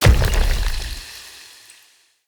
Healing Totem Impact; Powers; Fey; Ward

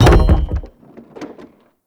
Debris Metal Wood; Ambience; Gameplay; Warframe; Entrati

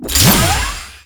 Shock Traps Embed; Warframe; Captain Vor; Abilities Redux

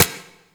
Turret Low Clip; weapons; Lasrian APC; Warframe

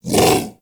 Vocal Bark; Warframe; Predasite; Hunting Critters; Characters

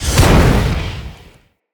Casting Device Cast Heavy; Weapons; Player; Fenn; Casting Devices

